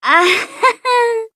broken laugh; ibuki

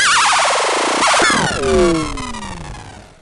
glitch more; runescape

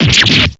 stab

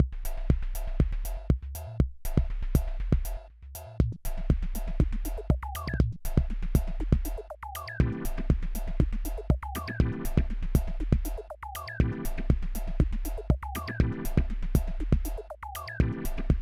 deepsea metro subway car

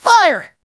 fire; Tod (DF2)

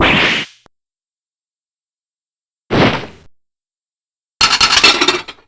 breakdown; aj olga

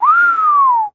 whistle

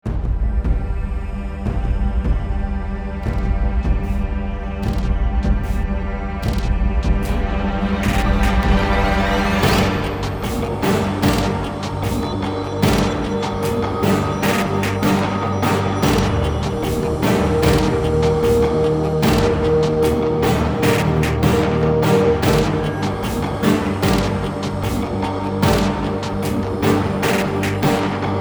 ambiance arctic battle

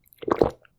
gulp

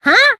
huh; usami and monomi

